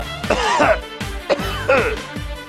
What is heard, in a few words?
secret sound